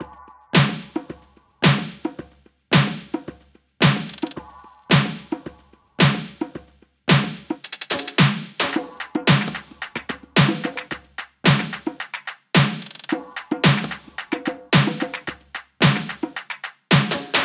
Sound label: loop top 110, Seismic Loops